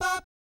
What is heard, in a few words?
BAP F#4, vocal jazz; ILIO; Vocal Planet; Jazz & FX; JAZZCOMBO